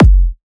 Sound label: Kick, Techno, One-Shots, Drums